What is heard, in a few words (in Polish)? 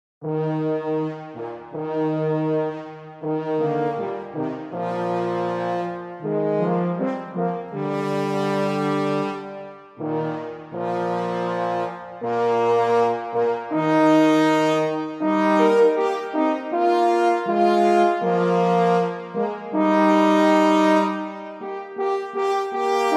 hejnal